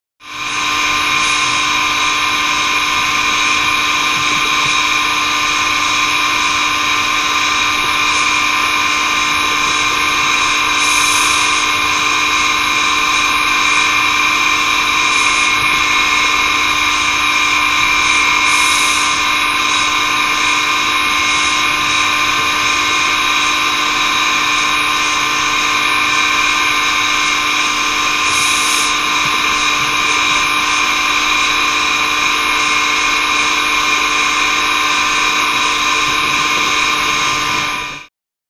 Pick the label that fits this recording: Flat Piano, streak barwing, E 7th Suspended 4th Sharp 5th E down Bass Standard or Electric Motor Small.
Electric Motor Small